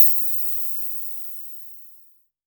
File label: Crash, Cymbals; Vengeance Samples